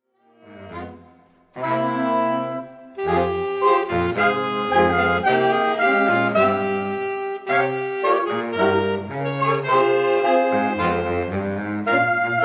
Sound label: Tango, sax; Herd of Sax are a saxophone ensemble group based in West Surrey, UK.